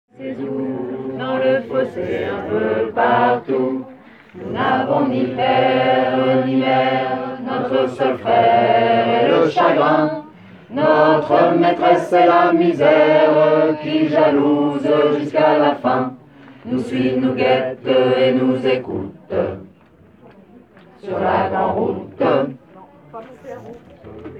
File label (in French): voix basse